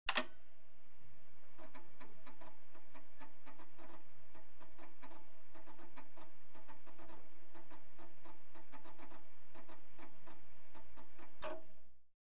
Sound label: Computers, Telex AND Typewrite; Computers Printers Phones; BBC Sound Effects Library